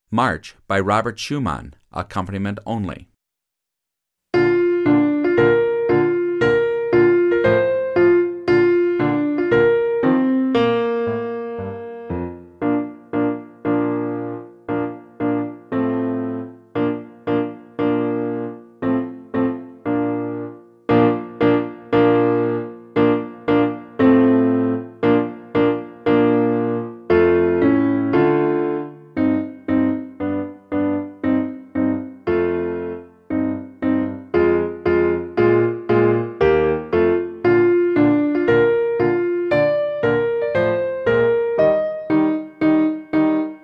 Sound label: March Accomp only